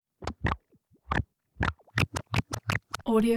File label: baby vocal buzz; prosoundfx